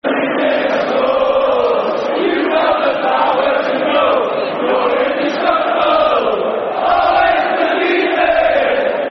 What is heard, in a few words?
Football Chants (Soccer Songs); Boateng; Middlesbrough Mfc